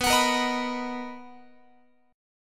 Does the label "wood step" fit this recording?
No